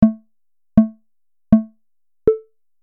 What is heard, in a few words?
4 bips